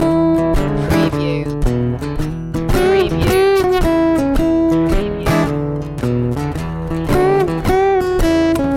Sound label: steel guitar loop